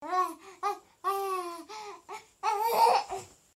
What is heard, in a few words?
Baby Sounds; Baby Sounds 7